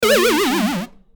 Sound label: retro game lose